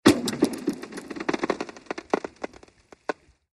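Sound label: Ice Creaks Short Crack, creaks exterior; IMPACTS & CRASHES - ICE CREAKS: EXT: Short cracks, resonance, some water movement.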